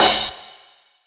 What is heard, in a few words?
CYMBALS, Disco House; USB Soundscan vol.20; Fresh Disco House I; AKAI